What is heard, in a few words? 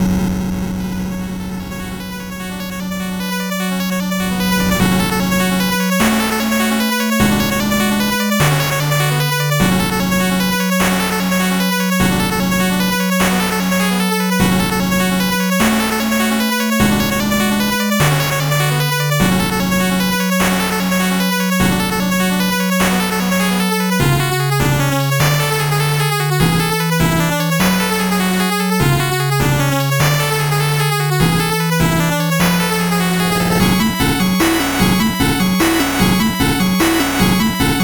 8bit bgm slow